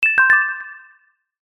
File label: multimedia notification mallet synth dreamy